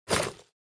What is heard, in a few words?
Chainmail armor crafting